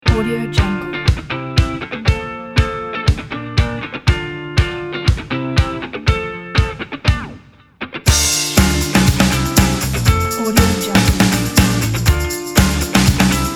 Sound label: californian rock